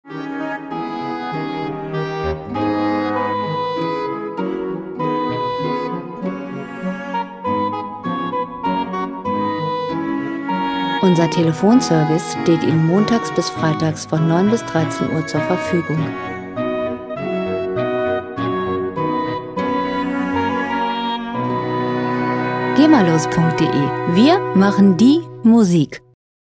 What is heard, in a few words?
little fuga